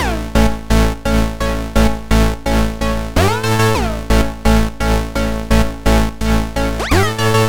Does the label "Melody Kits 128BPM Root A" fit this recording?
Yes